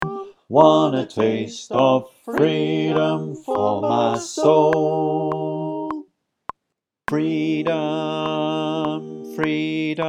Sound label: Bass click track